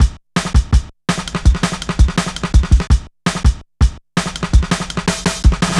Shuffling Live, Jungle Drum Loops; Zero-G Jungle Warfare 1, 2 & 3; 90 s Sample CDs

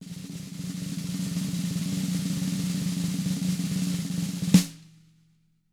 ROLL, SNARE ROLLS; ILIO; Double Platinum Drums 1

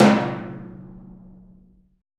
PRC AMB, Africa, Hand Drm; Roland LCDP14 Africa VOL-2; Ambient Tar

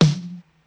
Snare Drum pss795 Snare; Polyend; Legowelt

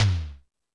Toms, Drum Machines E-MU Pro-Cussion

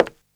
Footstep, household rhythm samples; Hits

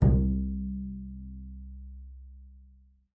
C2, bass pizz; Xsample VOL-5

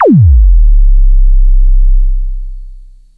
thump; Best Service Alpha Dance vol.2; ZAPS+THUMPS

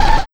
Kick, Kit; Sonic Foundry (Sony Creative Software); Esoterik Beatz; Agrymet

